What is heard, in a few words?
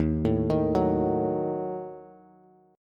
Eb Diminished Eb down Bass; Ebdim arpeggiated; JGuitar